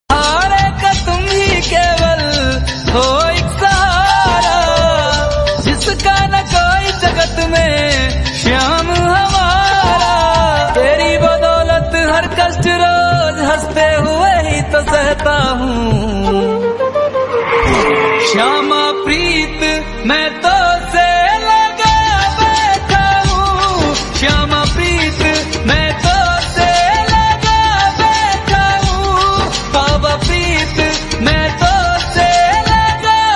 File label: Bhajan Hindi Ringtone